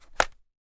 telephone receiver pick up plastic modern, household